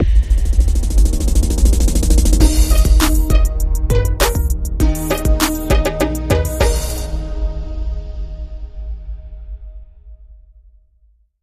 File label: Sonic Hip Hop